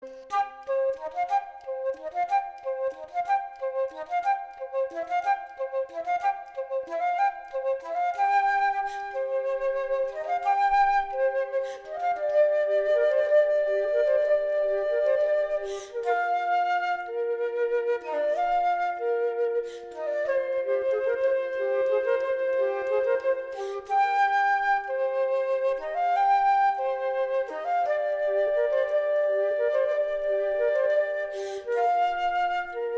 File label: Flute Tone